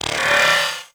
Arachnoid Camper Step Movement; Warframe; Corpus

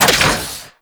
Hatch Open; Railjack; Archwing

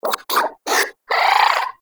Player Lure Call, Animal Lure; Warframe; Deimos; Nexifera

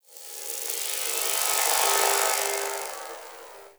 Resonant Energy; Ambience; Warframe; Corpus Railjack

